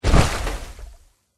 water walk, power armor; clothing system

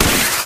wire shoot

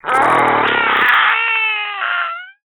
die, zombies

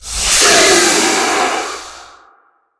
spider threat

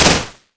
glaive hit wall

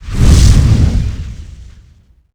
dragon take off

